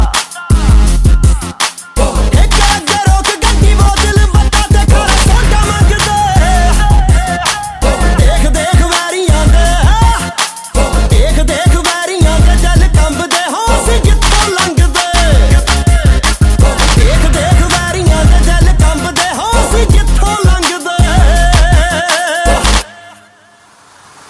Punjabi

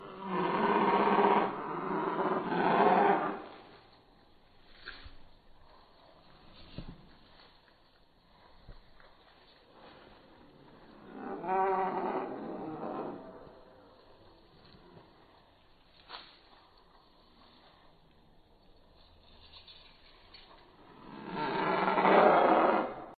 Great dinosaur sound

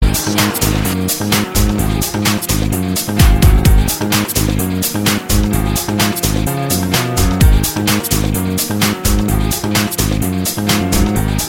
Gitar N Drums

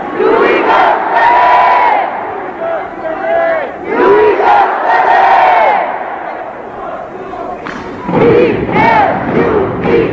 Cheer

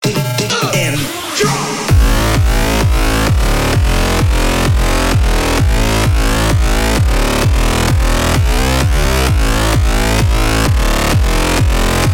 Drop

